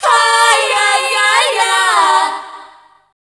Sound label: FEMALE CHOIR, Dance Vocals; Best Service ProSamples vol.12; AKAI